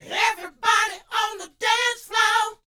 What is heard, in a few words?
LET, Vocal, R&B Dance, DANCE 120